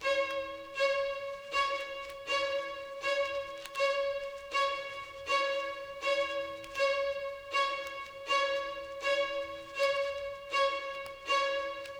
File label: Classic Melody Loop 80 BPM A Maj Strings; Melodics; Loops; Loop Stems; Cymatics; Eternity